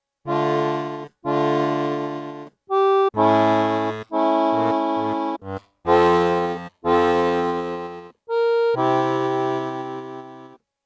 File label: Kirnberger